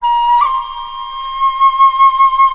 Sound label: japanese wood flute; Flutes